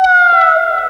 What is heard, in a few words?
CRYIN GTR, Funky GtrLicks; E-MU Formula 4000 Series Vol. 1; Hip Hop Nation